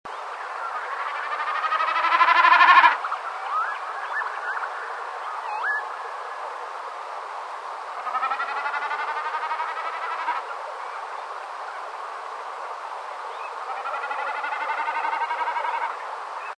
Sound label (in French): becassine des marais